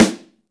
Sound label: SNR, Snare; Club-50 - Foundations Roland; Ext.Snare